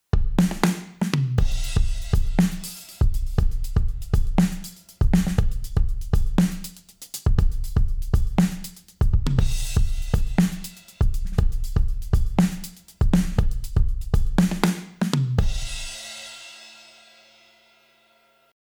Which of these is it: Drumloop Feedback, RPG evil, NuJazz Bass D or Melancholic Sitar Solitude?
Drumloop Feedback